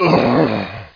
death, knight; Quake

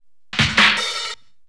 PERCLOOP D+B 160, Drum+Bass Loop; eJay

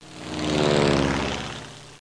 plane

